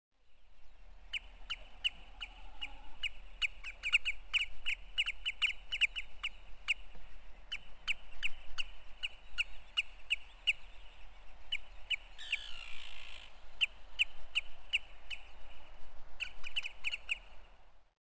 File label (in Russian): bolshoy ulit golos